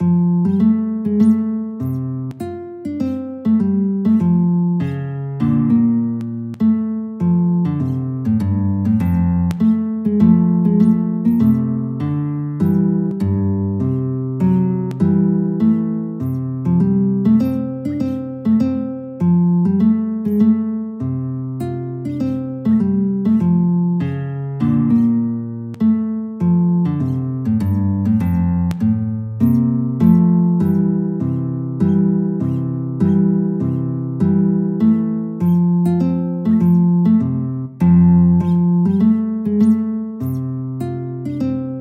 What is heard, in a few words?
guitar